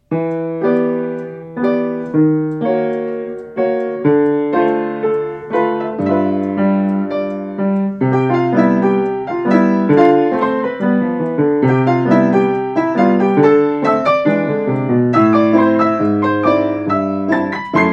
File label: saxophone blues